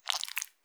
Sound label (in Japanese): 膣内射精